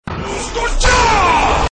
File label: dragon shout; skyrim